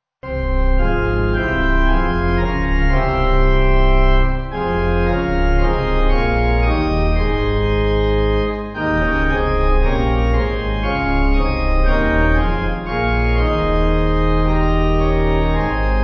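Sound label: Pipe Organ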